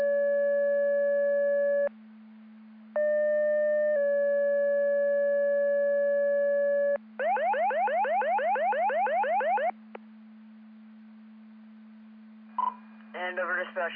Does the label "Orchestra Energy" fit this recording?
No